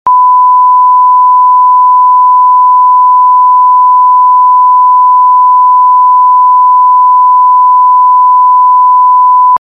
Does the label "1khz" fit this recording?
Yes